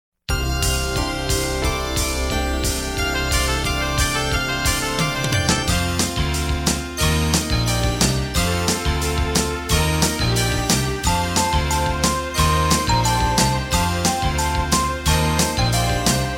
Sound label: Staff Christmas Greetings Bed